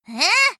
eeeeh!; nico

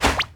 distraction; crab

